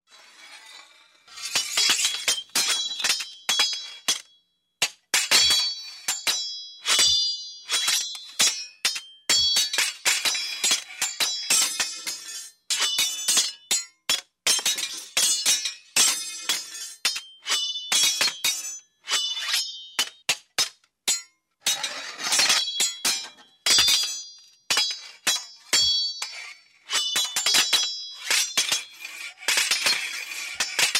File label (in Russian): zvuk srazhenija na mechah